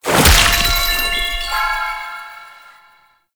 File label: Paint Deposit; Gameplay; Warframe; Shadowgrapher